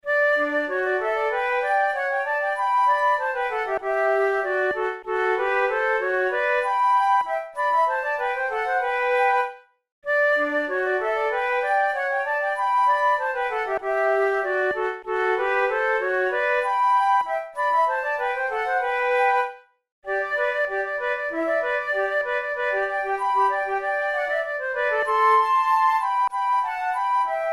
duet; weber; der freischutz

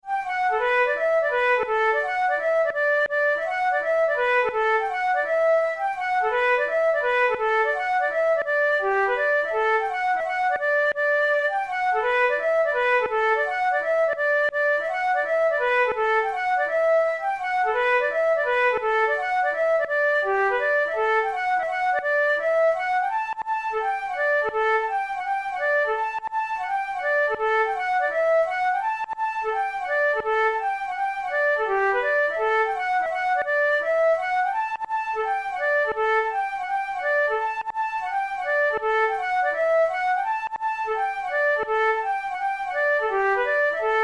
kiltie hornpipe, flute tunes

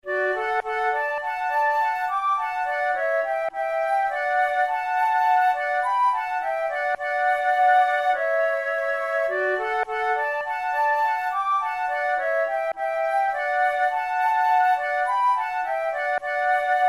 duet, flute

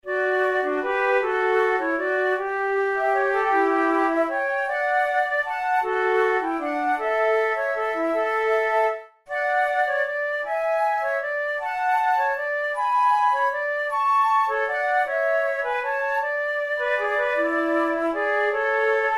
sonata for two flutes in B minor amoroso

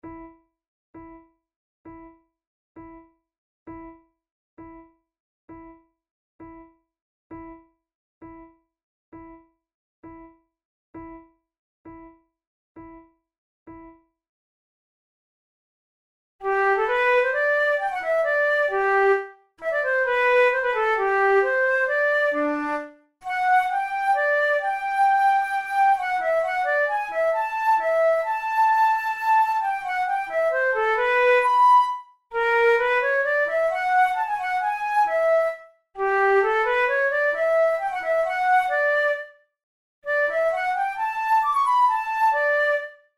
sonata for two flutes in E minor andante

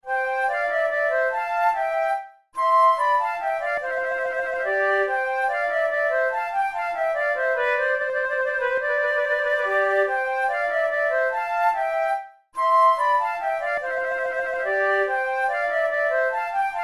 menuets; Naudot; 6 babioles

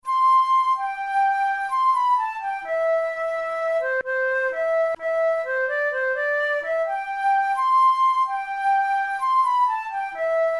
flute

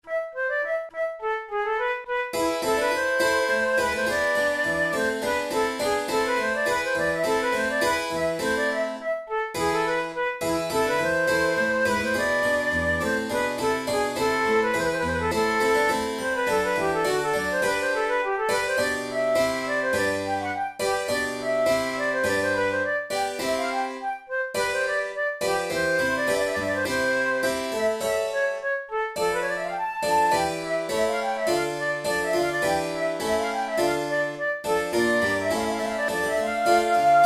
vivace, flute

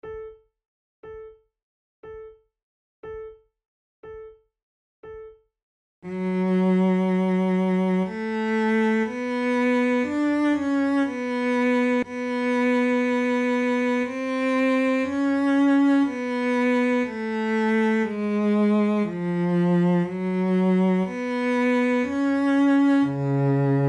adagio, flute

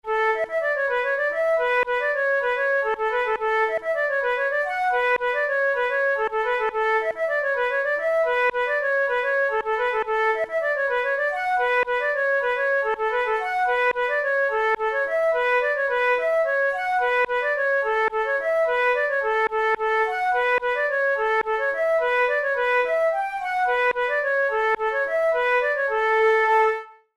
flute

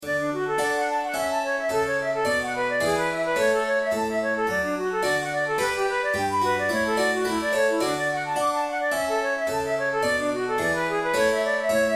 allegro, flute; corelli

